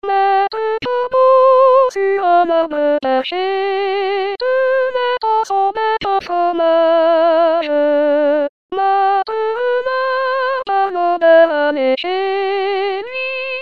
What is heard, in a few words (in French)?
Soprano, Chorale